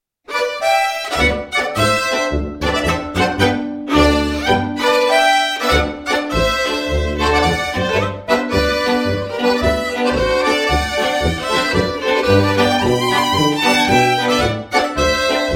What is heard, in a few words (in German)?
Steirer Marsch, Geigenmusik